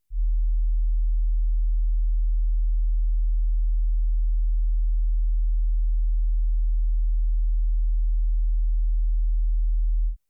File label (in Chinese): 40Hz 測試訊號; 雨果金碟